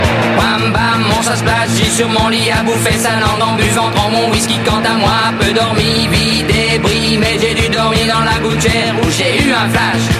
retro punk music

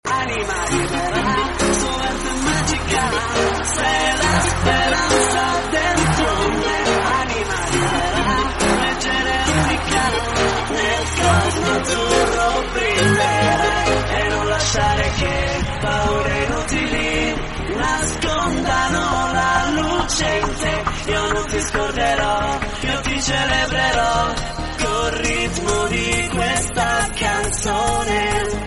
italian song

